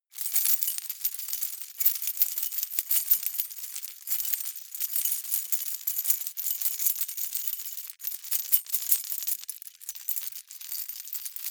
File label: key ring large rattle air sequence medium; objekte; schluessel